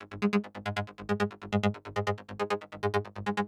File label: Bassline 138BPM, G# minor; Vengeance Samples; Manhattan Kit